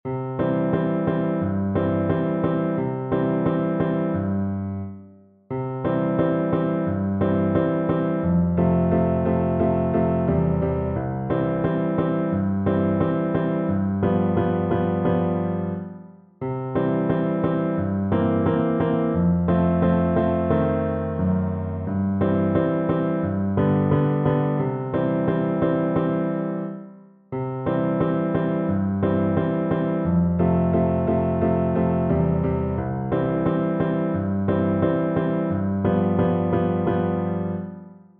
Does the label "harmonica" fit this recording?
Yes